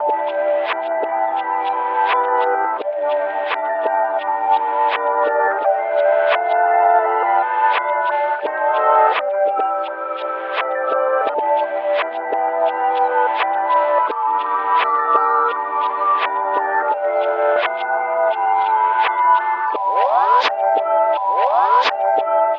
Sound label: songstarter 85 G, Pop; Slate; SD PASADENA Pop Sample Pack